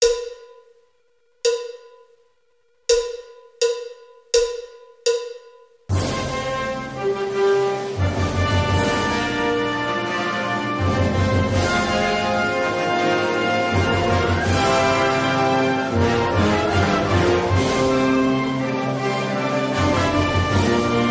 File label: Fanfare Tattoo